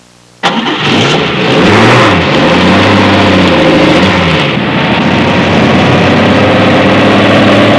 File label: startng, transportation